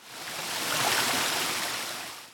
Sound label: create Wave; GGJ 2017; IronToad